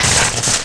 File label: lands park; terrains; Tony Hawk's Project 8; XBOX; nj